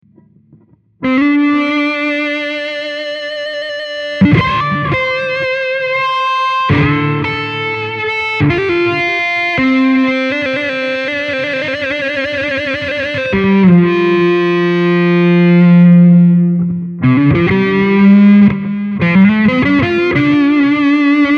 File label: drone